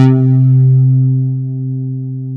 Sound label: ANALO BAS, ANAL BASS